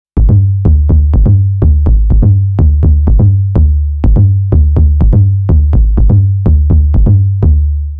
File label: TOMBASS